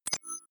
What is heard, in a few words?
click hover, signale; soundpool